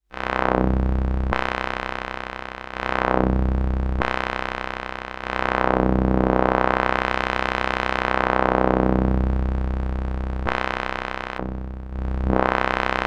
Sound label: wersi bass saw + sqr + wah wah